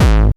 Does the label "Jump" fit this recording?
No